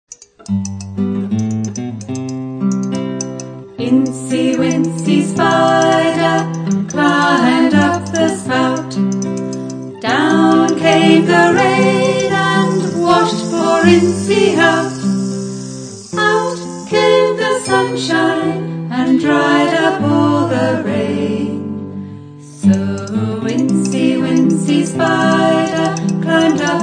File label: incy wincy piccolo song